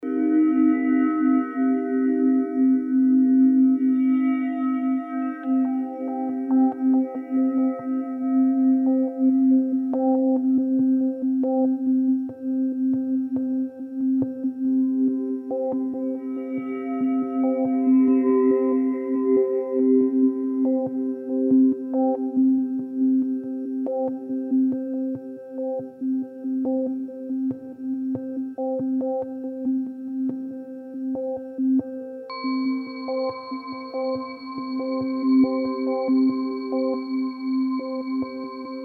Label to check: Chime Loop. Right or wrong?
Right